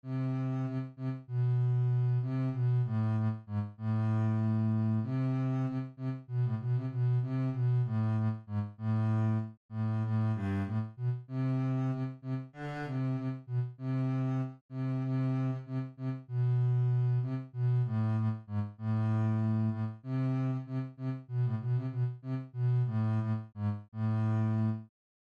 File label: bass, string bass; Helas